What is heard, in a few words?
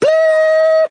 "ooh" high; ooh ahh